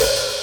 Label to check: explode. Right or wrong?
Wrong